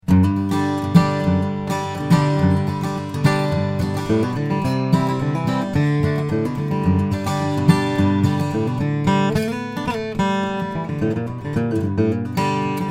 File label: santacruz d pwb, steel string guitar